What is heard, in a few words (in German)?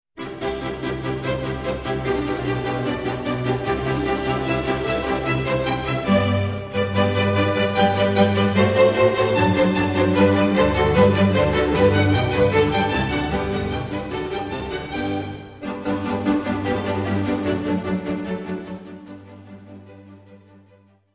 Musikbeispiel ohne Gehoerschaden